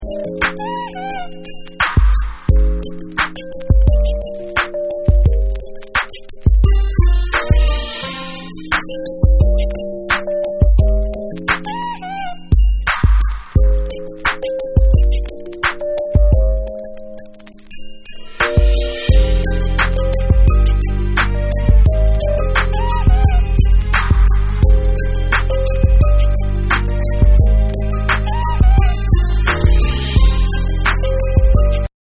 Instrumental

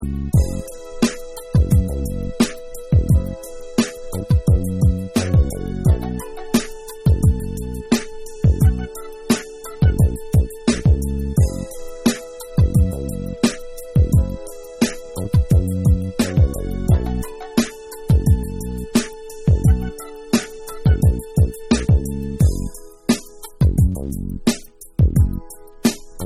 Instrumental

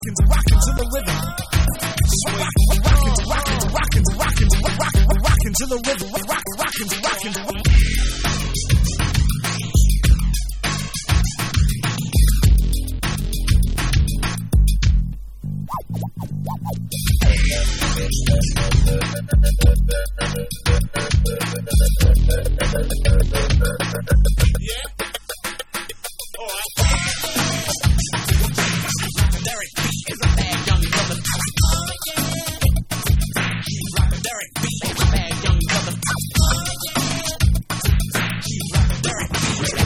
Instrumental Dub

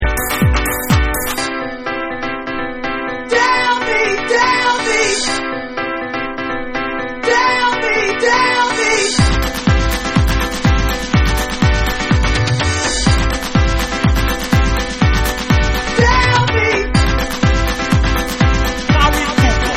GRAND PIANO

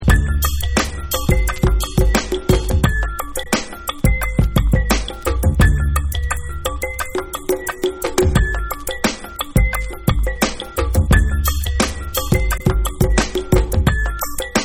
Instrumental

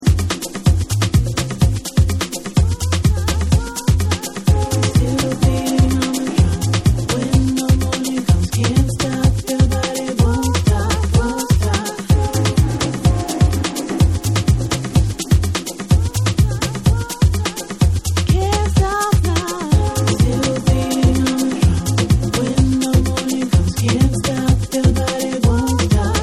Club Dub

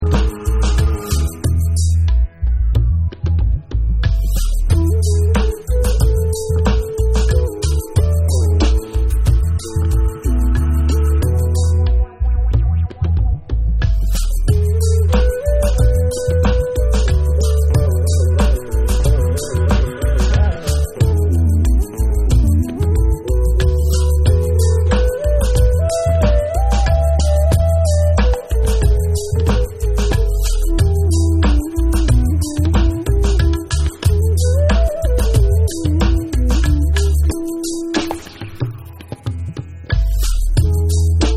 DUB

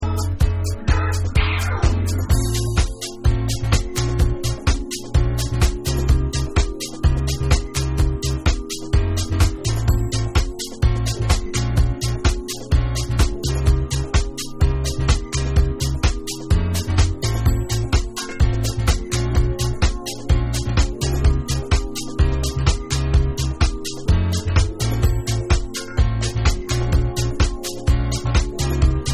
Instrumental Version

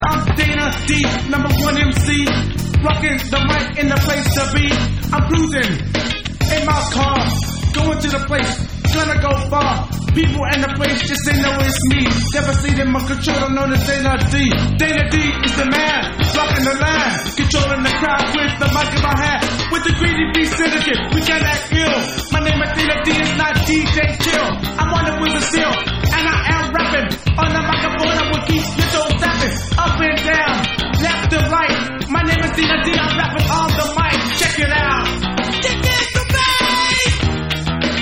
KICKIN THE BASS

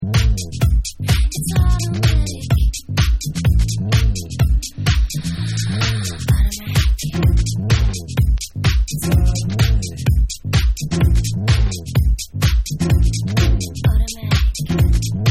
Dub

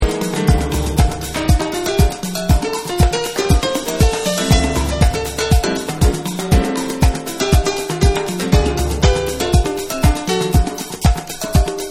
Piano

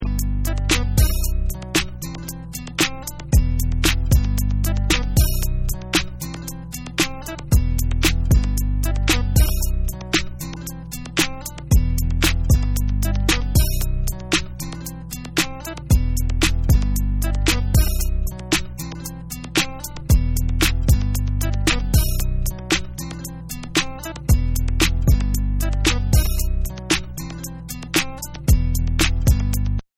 Instrumental

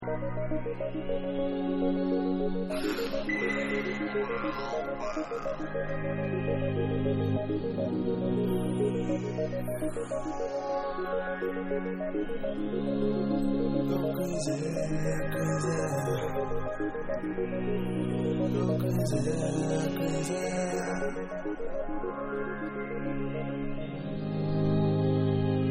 Acappella Mix

